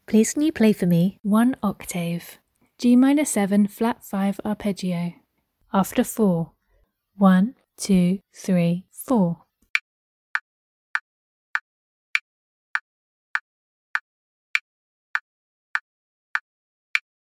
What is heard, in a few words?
g min7b5 arp